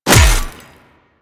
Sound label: Melee Hammer Impact Robotic Flesh; Warframe; Weapons; Hammers